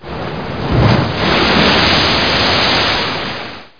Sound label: surf